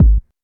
old school low kickdrum g sharp key, kickdrum tuned to the G# note